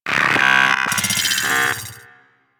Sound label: Vocal Idle; Warframe; Amalgam Moa